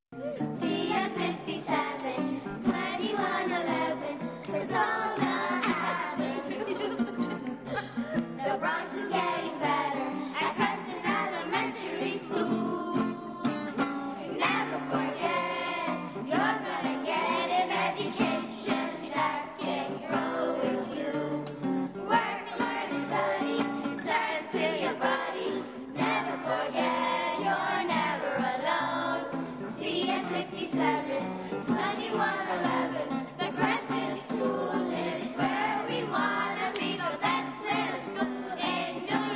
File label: song laugh